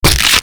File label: Brawl Knee Kick B; Warframe; Brawling